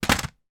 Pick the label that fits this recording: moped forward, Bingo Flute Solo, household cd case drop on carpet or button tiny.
household cd case drop on carpet